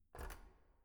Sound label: door remove key